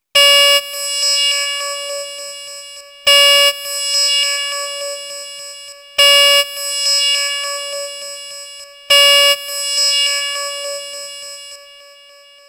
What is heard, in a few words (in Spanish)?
alarma; sound effects